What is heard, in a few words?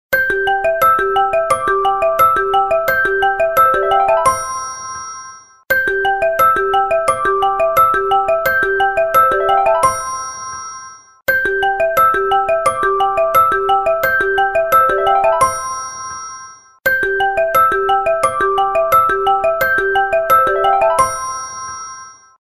Rolling, ringtones; Coolpad